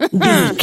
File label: voice female expression emote